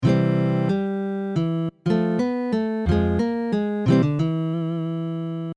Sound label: game over; mario guitar tab